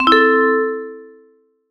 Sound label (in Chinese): 星星道具; ArrangeTheShelves